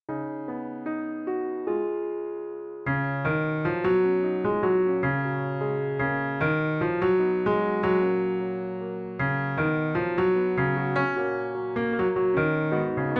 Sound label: Melody; FOLK